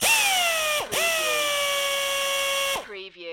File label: Pneumatic Drill